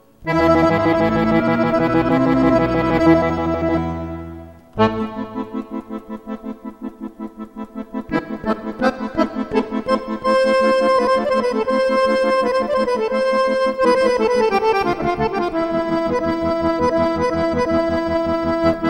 Accordionist; Cavalleria leggera; Houston

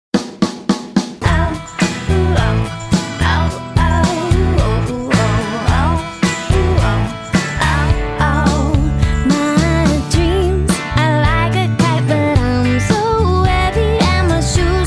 Pop Singer

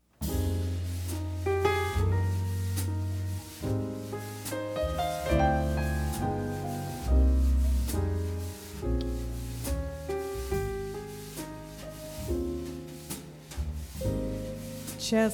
Christmas Trio; christmas song; Seattle

